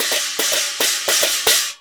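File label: CHINA, China cymbal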